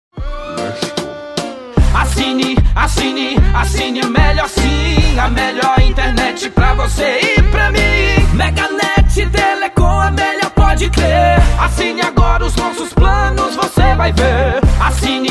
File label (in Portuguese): jingles para provedor de internet; Tik Tok